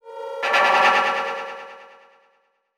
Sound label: Transition Delay Medium